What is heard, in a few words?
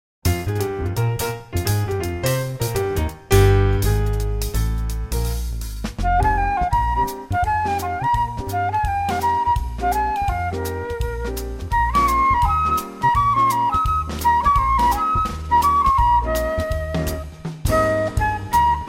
fl rhythm